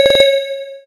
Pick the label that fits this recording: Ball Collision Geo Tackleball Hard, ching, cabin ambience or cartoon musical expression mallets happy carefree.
ching